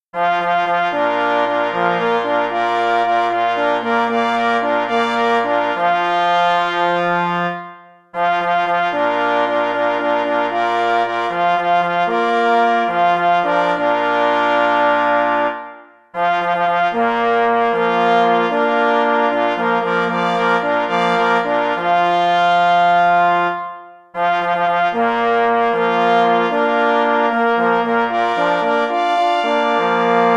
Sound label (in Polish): Na powitanie gosci na 2 plesy 2 parforsy; Cz. Trybus